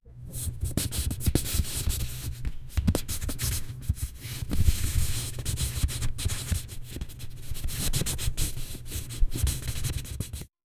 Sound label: knitting motion design